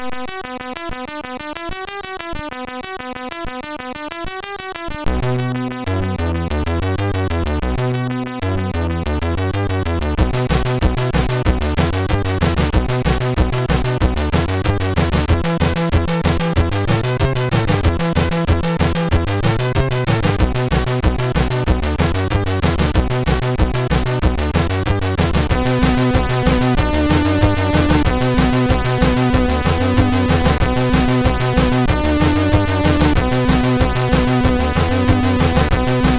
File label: Pop Shuffle, SID MOS6581R3